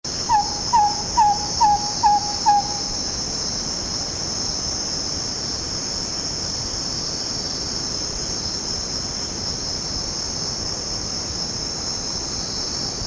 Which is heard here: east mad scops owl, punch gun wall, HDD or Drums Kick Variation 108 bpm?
east mad scops owl